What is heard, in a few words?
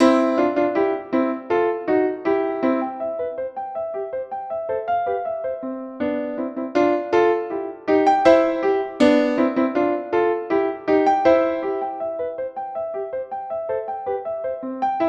Song of the Cuckoo; PDMusic Volume 1